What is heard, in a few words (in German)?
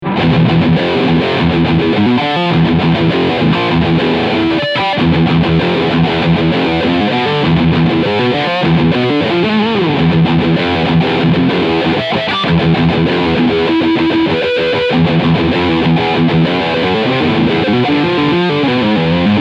Steg; Riffsound